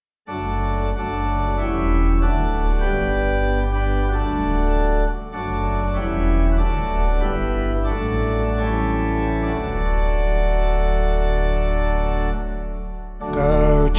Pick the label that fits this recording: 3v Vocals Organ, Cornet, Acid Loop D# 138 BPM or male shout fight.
3v Vocals Organ